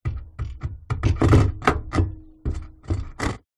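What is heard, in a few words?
Metal Swing Clank Wood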